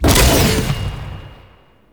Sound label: Gun Blade Shot A; Crp Gun Blade